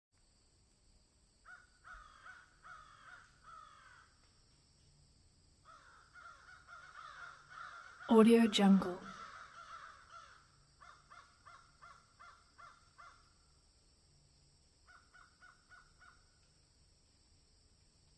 crows in summer

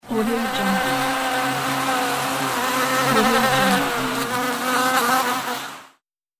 bee buzz

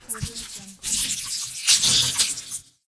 scifi biomechnical movement sound

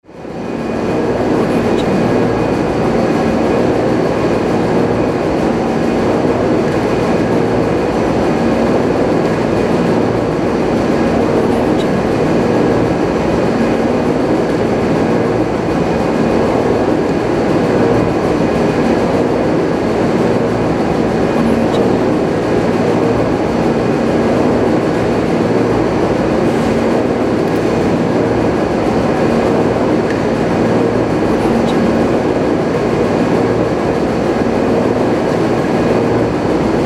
factory; cat track

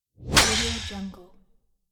fast whoosh to hit; konstantin

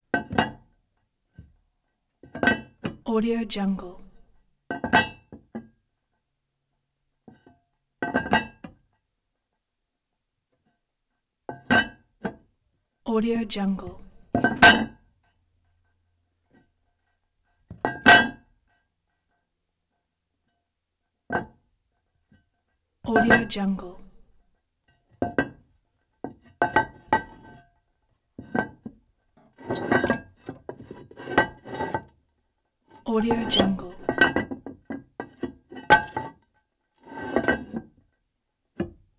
toilet tank cover heavy ceramic handle movement

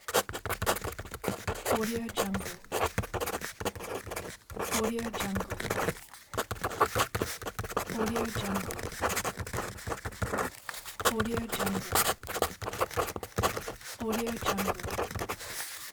fast writing

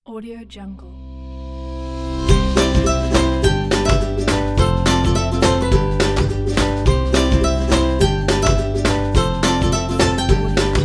ukulele background

